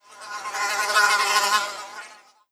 Fly; افکت صدا حیوانات; Animals

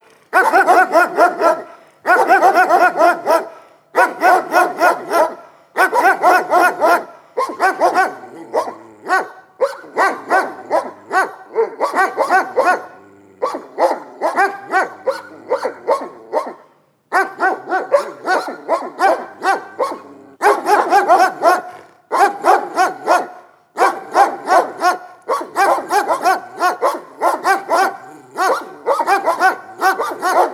Two Dogs Barking Dogs; افکت صدا حیوانات; Animals

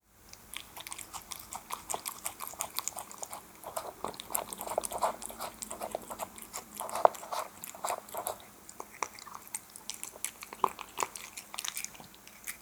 Cat Eating Wet Food; افکت صدا حیوانات; Animals

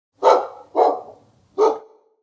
Barking Dog; افکت صدا حیوانات; Animals

